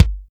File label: smooth kickdrum sound g sharp key; kick drum samples